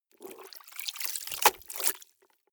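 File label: clothes water up down hard, household; haus-garten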